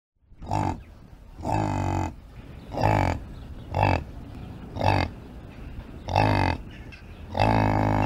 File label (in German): yak; haus und nutztiere erkennen